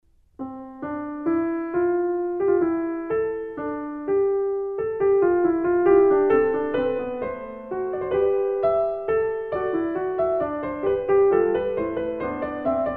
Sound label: Prelude Fugue in C Major